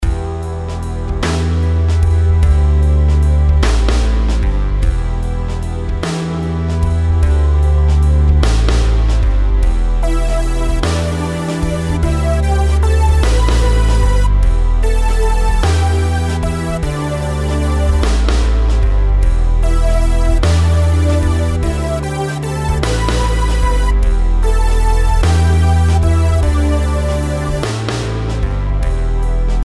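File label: melodies compound 8s E minor; melody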